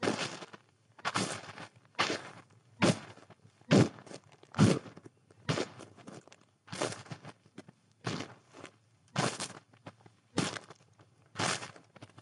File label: footsteps snow